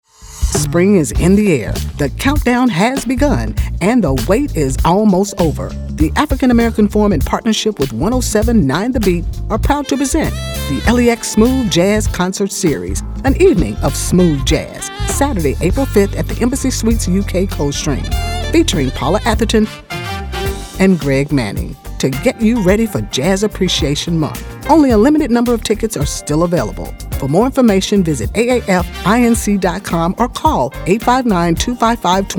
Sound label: Smooth Jazz; Sponsors